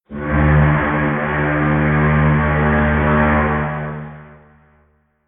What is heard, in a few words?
Boat Whistle